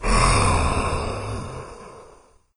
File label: idle breath; doom3; commando